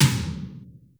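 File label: processed tom, drums; Crypton - DrumBase5000; PROC.DRUMS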